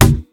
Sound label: percussion; VES1 Percussion